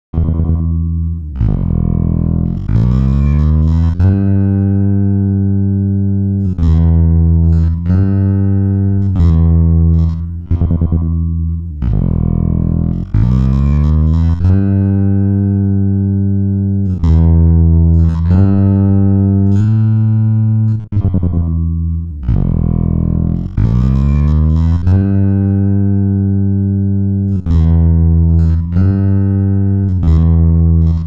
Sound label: bass; ikm sampletank stretch; timestretch